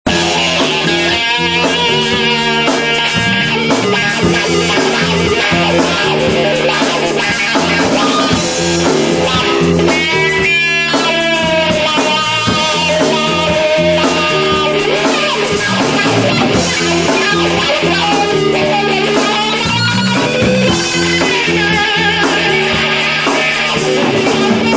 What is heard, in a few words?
torch, guitar; 86 squier